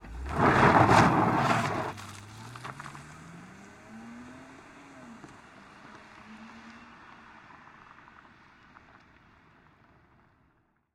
BMW, 323i, Dirt, Away, Peel Out, Fish Tail, Hard Accel Away; 2007